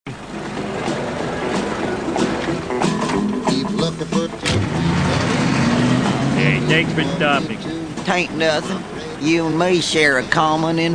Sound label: error